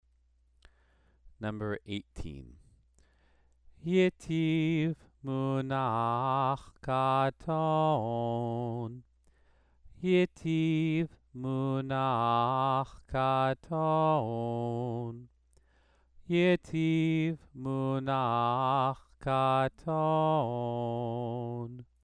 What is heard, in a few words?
Torah trope exercise